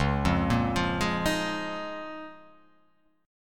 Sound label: C sharp 6th Add 9th C sharp down